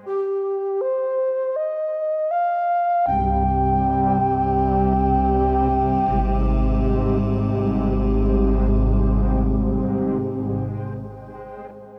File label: Deep Pads; BB 2HTC Samples Vol 4